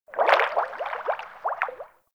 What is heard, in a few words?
Fish Tank Movement; Warframe; Hub; Liset Personal Quarters